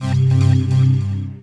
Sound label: program error